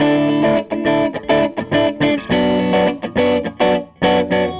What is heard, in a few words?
Gretsch Guitar Loop; Sonic Foundry (Sony Creative Software) - Six-String Orchestra